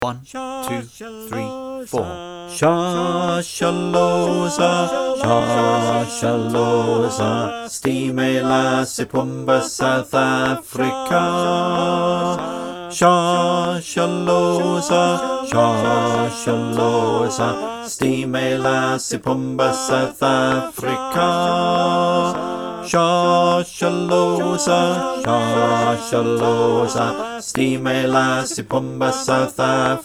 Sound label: Bass click; Shosholoza